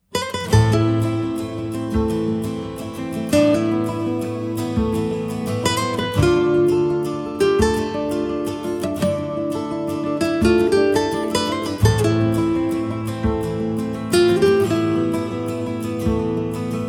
Portuguese Nights

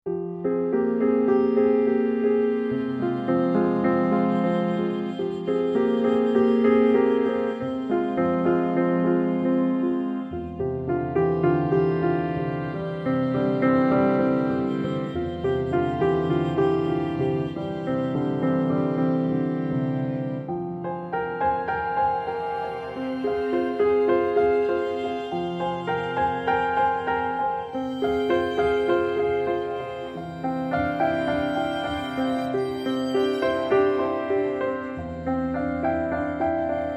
Romantic Scenery

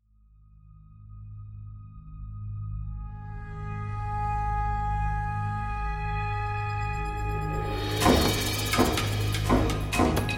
Snake Attack

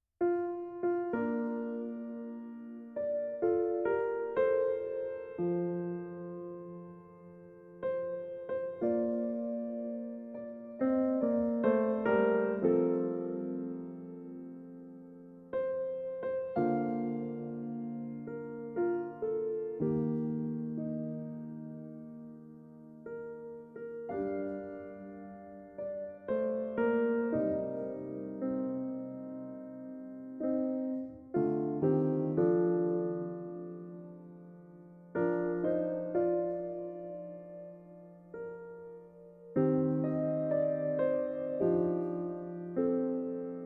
Classical Piano Song

